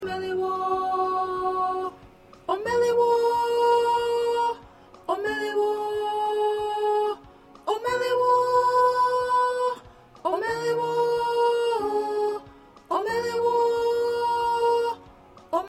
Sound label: Soprano 1